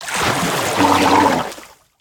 Swamp boss Show

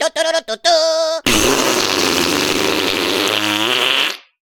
Fan Fare Fart

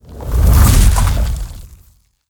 Ice Solid

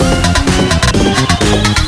Snd Speedup; Mindwave

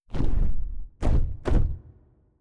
Skel Drake Flapp

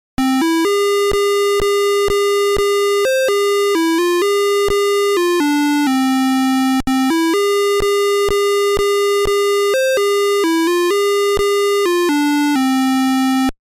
Ending; Ninja Golf; Atari 7800

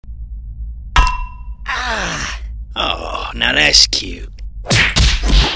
sweep; The Henry Stickmin Collection

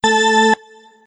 Count Down Go; Burnin' Rubber 5 HD

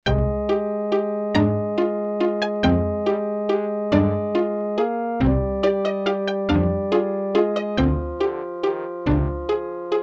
Ring Tone (Poly); Smeshariki: Kaleidoscope of Games

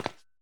Footstep Wires

